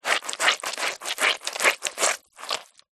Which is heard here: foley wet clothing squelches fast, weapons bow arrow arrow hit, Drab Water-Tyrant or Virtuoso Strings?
foley wet clothing squelches fast